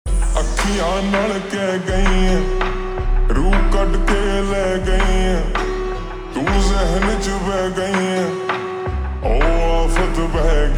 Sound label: Ringtone Slowed Reverb